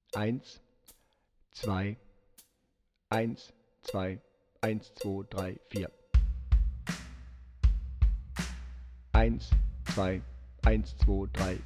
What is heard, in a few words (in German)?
Power Rock Playback Floete Oboe Lauter